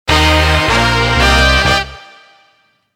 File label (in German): Audio Logo Krimi; ARD Das Erste; Tatort